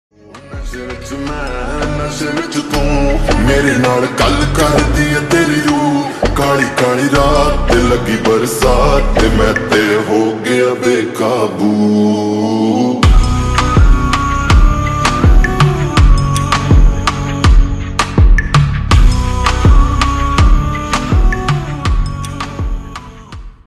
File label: Slowed Reverb Ringtone